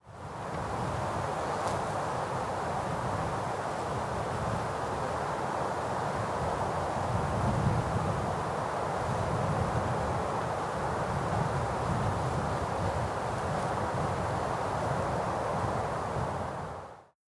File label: Dierkow Wind